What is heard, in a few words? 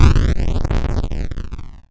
atari boom